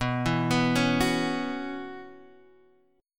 B Suspended 2nd Suspended 4th B Guitar Open D, chord